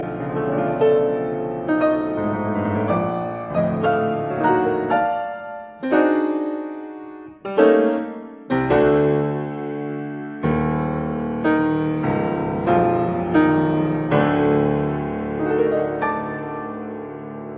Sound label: Piano Sonata